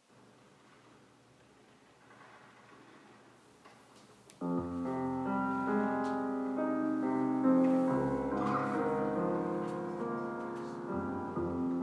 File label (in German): Tenor, Sanctus; Messe; Chor